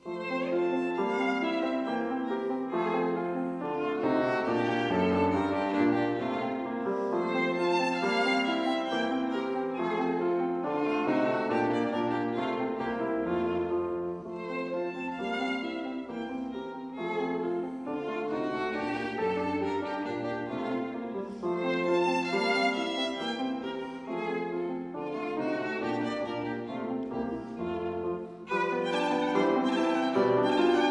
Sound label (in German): Violinsonate F-Dur - Variation IV